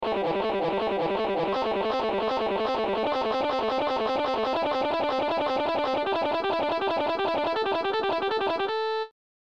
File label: picking exercise